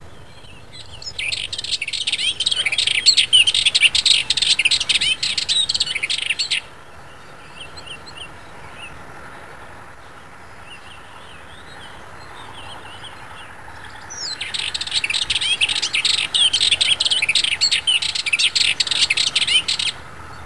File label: Sardinian Warbler